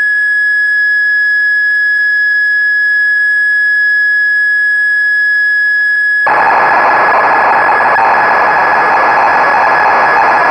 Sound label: Moog Memorymoog factory presets; Synthesizer Factory Patches; Cassette Interface